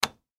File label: Switch On Device, Switch On Device Light Wall; Objects; Foley; Blastwave